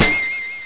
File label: danger ping; cstrike